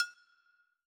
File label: perc percussion